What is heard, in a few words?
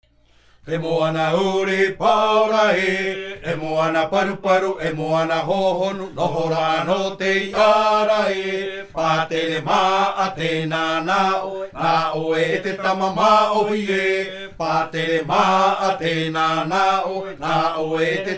Himene tarava Raromatai